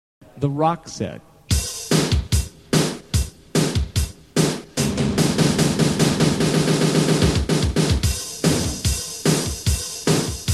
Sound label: LinnDrum